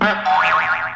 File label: boioioing cartoon sound effect